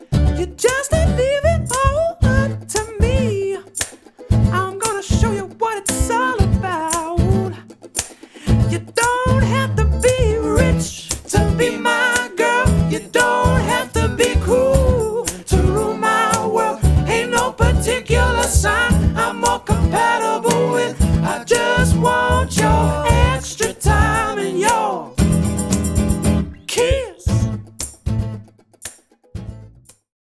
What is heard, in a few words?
Acoustic Band